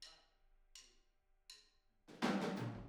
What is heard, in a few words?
Boogaloo - Room ST, 82 BPM; Drums; Intro; Sonic Foundry; Drums from the Big Room The Mixes